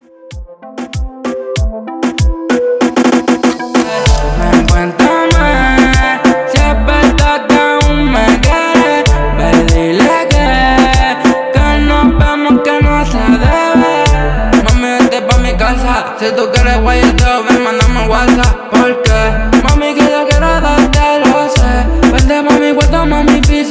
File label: Intro Verso 96 Bpm